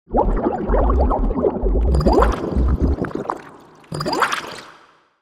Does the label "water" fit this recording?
Yes